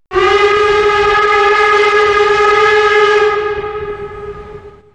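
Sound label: german, train whistle; hsb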